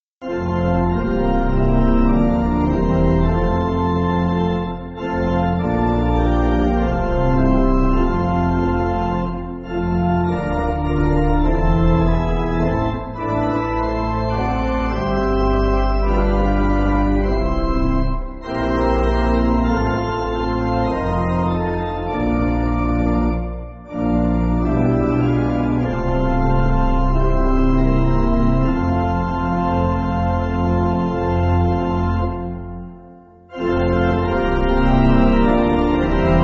Pipe, church music

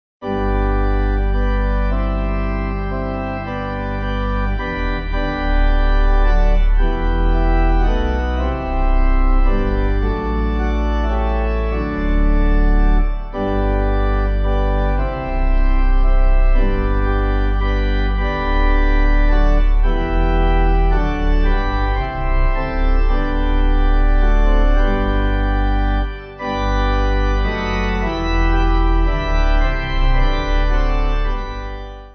Organ

